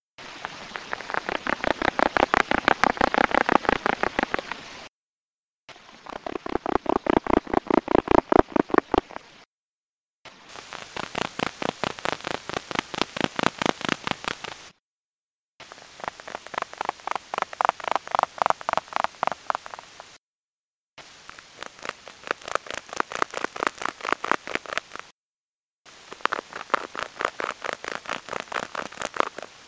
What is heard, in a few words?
groundweta drumming